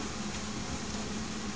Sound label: kitchen, indoors